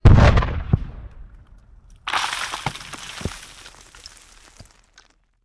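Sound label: Cannon Cannonball; Sound Ideas; Guns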